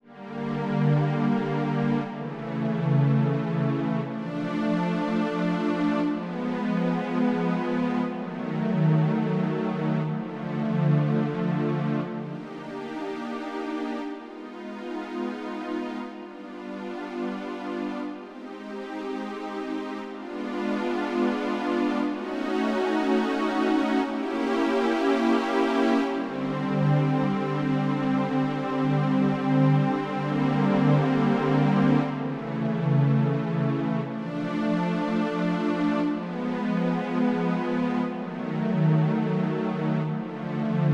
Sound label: Strings Synth; AR-K